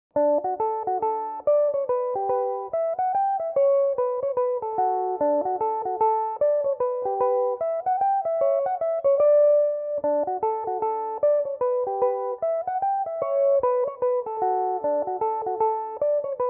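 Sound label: mazurka, D major, mandolin; Tommy Peoples' Mazurka; Belmuse EM-190 electric mandolin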